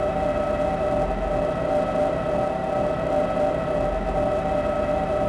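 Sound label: Fighter idle loop; vehicles; naboo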